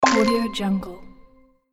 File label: combo game hit advance